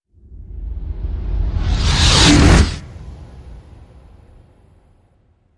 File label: Hit Hor Radius